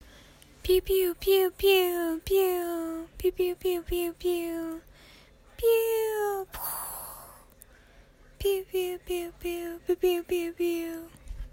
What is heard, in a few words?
Pew Pew